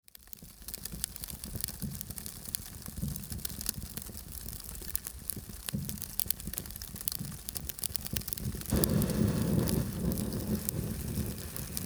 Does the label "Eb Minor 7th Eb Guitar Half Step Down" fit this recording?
No